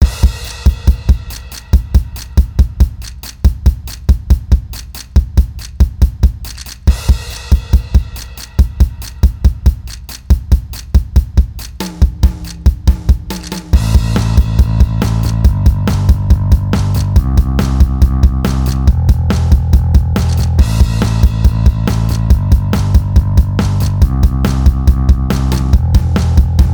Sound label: Play Guitar